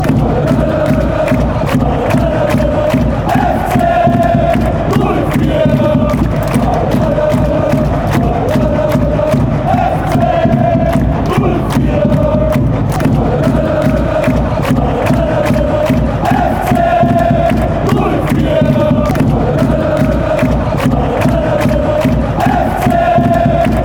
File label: shalalala fan chants